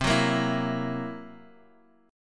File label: C 7th Flat 5th, C, down, Guitar, Open F; strummed; chord; JGuitar